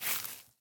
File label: grass step; Golden Crayon Sounds